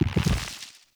Nunchuck Pulse; Warframe; Infested

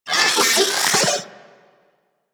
Vocal Detonate; Warframe; Corpus; Mini Moa

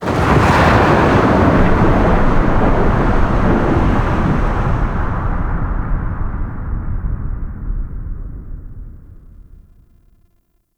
Environmental Booms F; Ambience; Warframe; Man In The Wall

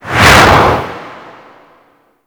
Air Rush Slow One Off; Warframe; Archwing

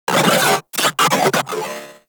Moa Vocal Attack; Warframe

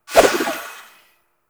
Kunai Whoosh, Weapons; Warframe; Choir

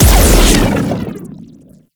Overhead Long Smash Attack Impact; Enemies; Warframe; Grineer Nightwatch

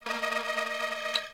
Beep Stutter; Warframe; Corpus

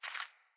Hare Footstep; Foley

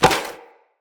Sphere Sword And Board Catch; Weapons; Swords

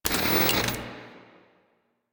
TV Turn On; Arthur; Warframe; Cinematics; Hub

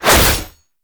Fist Claw Whoosh; Weapons; Warframe; Tenno; Incarnon

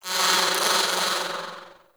Clan Dojo Garden Fly; Warframe; Garden Animals

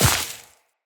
Step Veg, Footsteps, Walk; Characters; Tenno

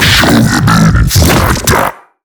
Hand Bomber Idle; Warframe; MiTW; Battle Barks

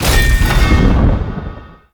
Void Dragon Glaive Pickup; Enemies; Gameplay; Teshin; Warframe; Duviri

